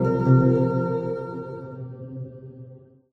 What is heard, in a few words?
hacking sphere tail